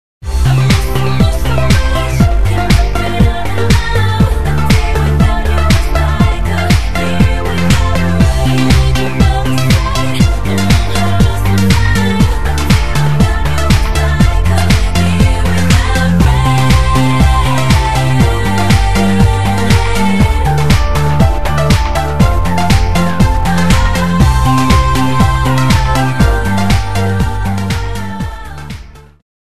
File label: karaoke; disney